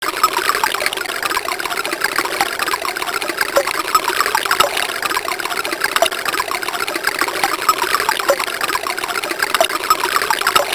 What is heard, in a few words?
Chord B